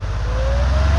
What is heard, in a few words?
Rev Car Start; PC Gamer IT CD 49; UrbanChaos